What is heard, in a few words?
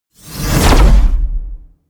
promo pad; whooshes-rises